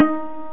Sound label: pluck, net instrument; Internet 1996 World Exposition; Brain Opera; net-music